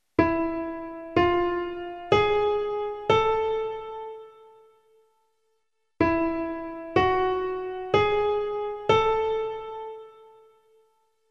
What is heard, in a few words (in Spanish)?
armonica melodica escuchar asc